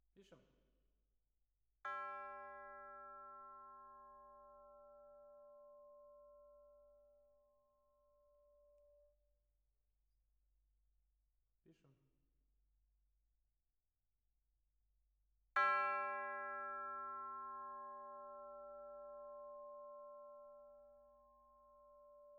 Twin F Perc

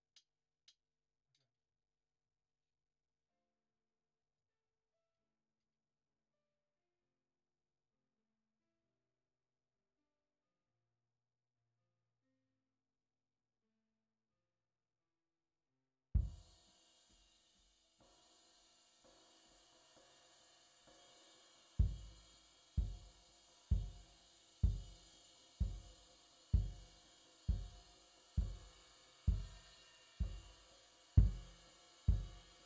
Kick IN

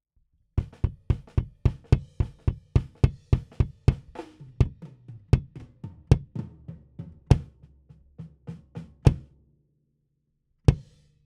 Kick In, RNR for piano

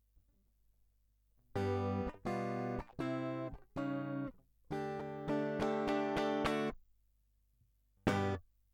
Gtr Line, RNR for piano

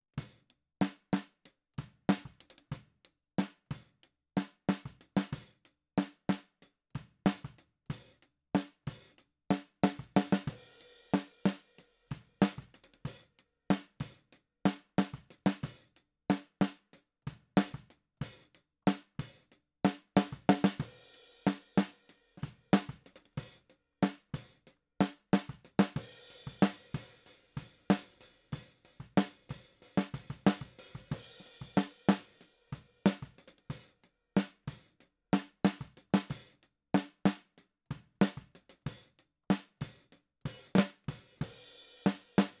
Snare

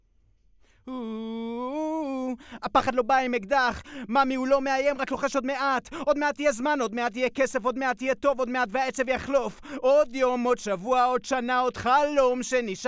VOX

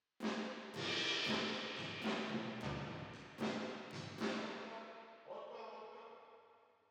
Drums Verb

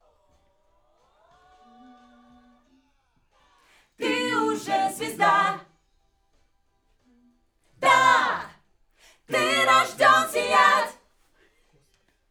Backs AKG

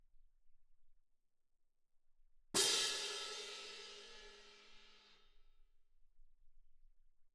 Perc

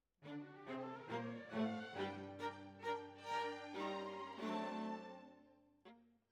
Violas, jazz for piano

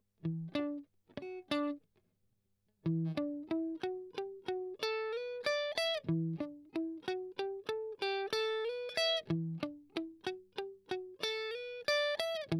Strum Octav Arp

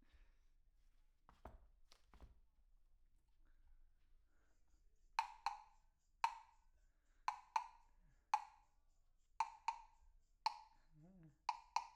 PERC OH

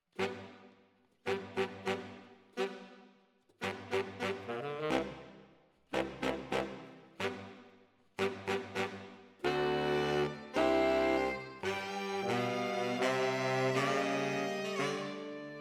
ORTF SCHOEPS, piano

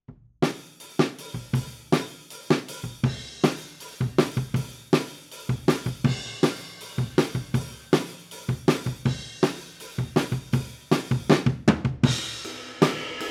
Kit, 160 BPM

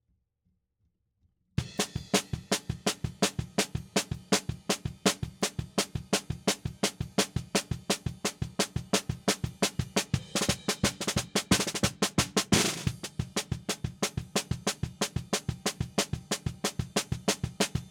SNR BTM

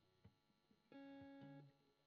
GTR Royer

